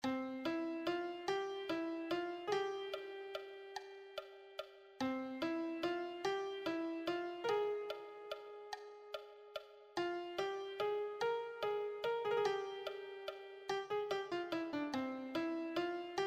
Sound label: melody